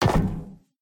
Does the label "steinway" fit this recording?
No